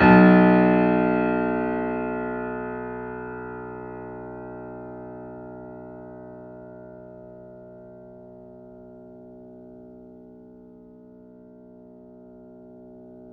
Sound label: Mf B 6, Grand Piano off mic